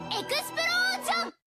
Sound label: Explosion; Megumin